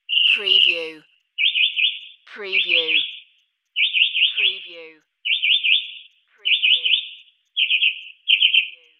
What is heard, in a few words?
Mocking Bird Call